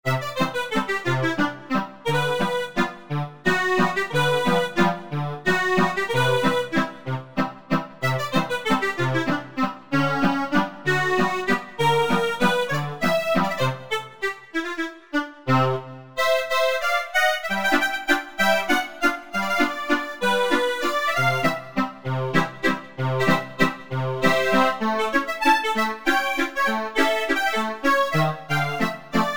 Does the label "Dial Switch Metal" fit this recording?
No